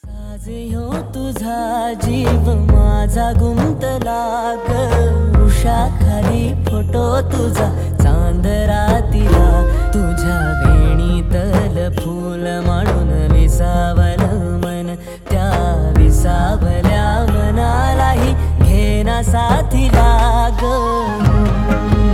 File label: Marathi Song Ringtone